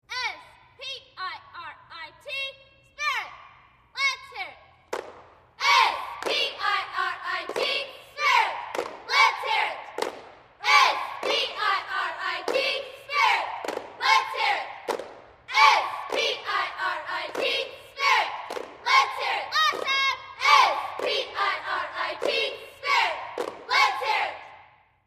Cheerleaders High School; Cheer 9; S P I R I T Spirit Lets Hear It, Exterior Perspective